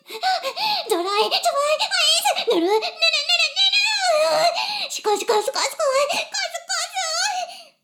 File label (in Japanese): しこしこ音声; 少佐殿; 牧場戦腺